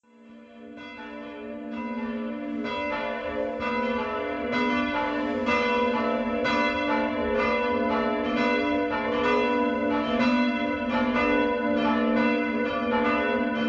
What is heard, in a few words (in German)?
Glocken Graefenhausen